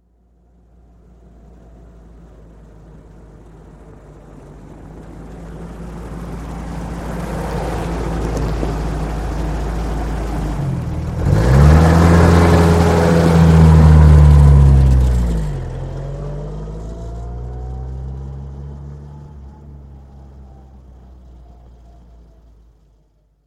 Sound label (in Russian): uazik medlenno podezzhaet i edet